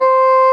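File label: soft bassoon C5; Northstar - New Gold VOL-2; SOLO BASSOON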